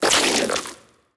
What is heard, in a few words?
attack; gurglings